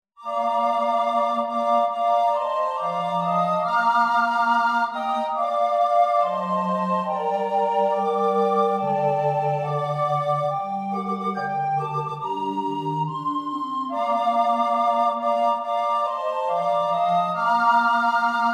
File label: Ukrainian for Recorder Quintet